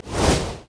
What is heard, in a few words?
woosh